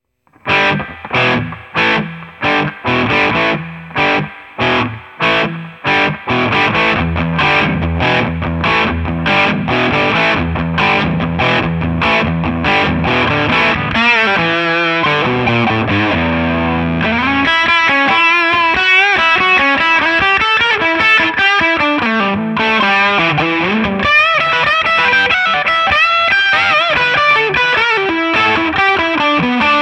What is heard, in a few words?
Express Blues